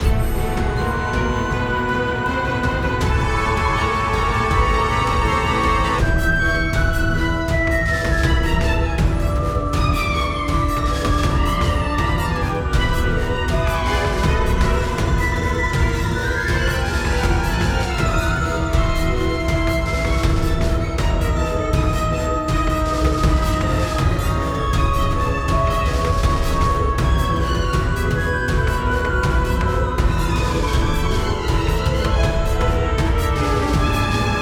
Boss BGM Wrath Intro; sinner sacrifice for redemption

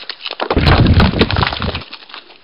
boulders

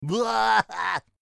Character Black Cheer; Angry Birds Epic

